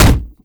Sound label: impact, wood chair